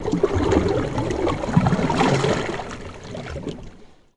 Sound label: Canoe Paddle Stroke Underwater